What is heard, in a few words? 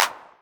Clap, Novation Drumstation